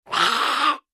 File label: Goblin pain hit small